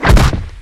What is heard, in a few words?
hydra bump; hl2